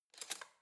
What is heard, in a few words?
cutlery spoon drawer grab